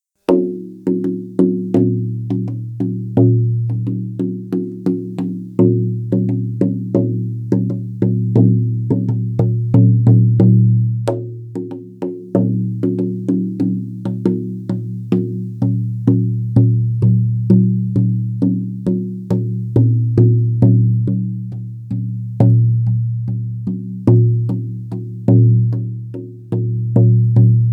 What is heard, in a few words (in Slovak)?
saman ruka, samansky bubon 45 cm; holy